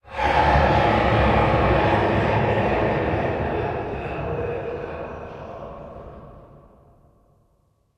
ambient port; World Of Warships; port3d

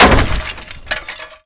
suburban crash impact; Call of Duty Modern Warfare; physics

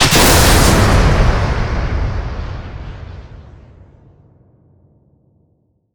Weapons Missile Launch; DCS

